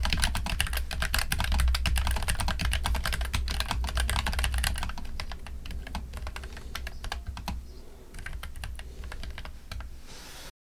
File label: keyboard